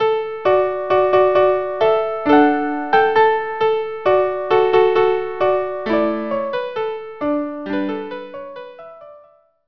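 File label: violin unaccompanied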